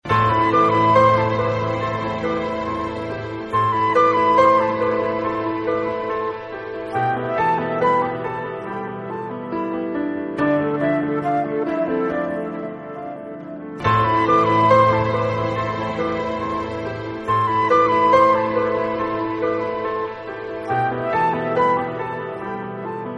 Bgm Tamil

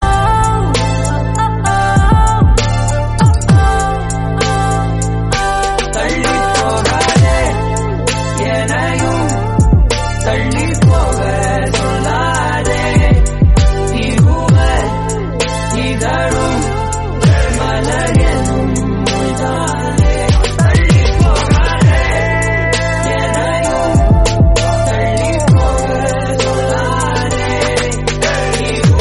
Beat Bgm, Instrumental

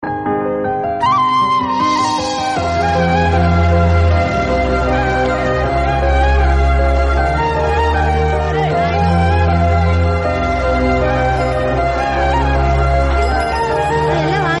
Bgm Instrumental

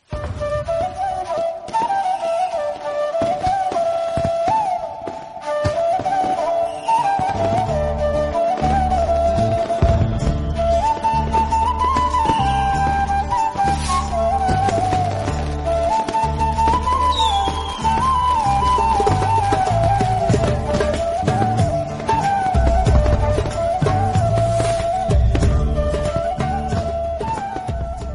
Bgm Instrumental

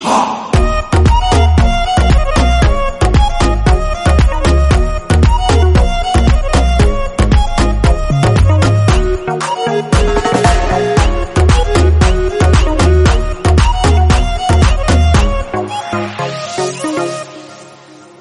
Instrumental

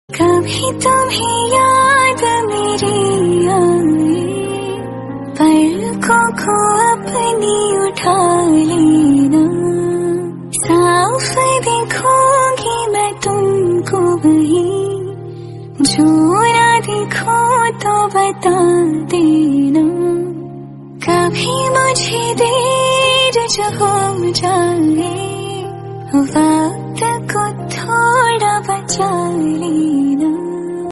Female Version; Shershaah

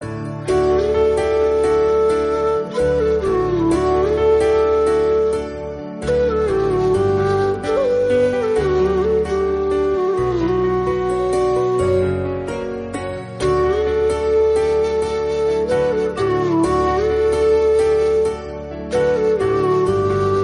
flute

